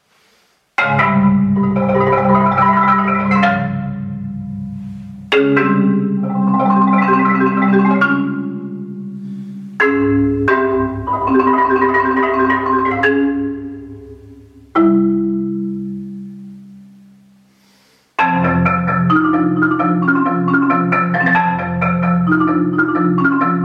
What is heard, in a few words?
Symphonic Marimba